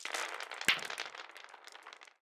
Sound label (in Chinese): 弹珠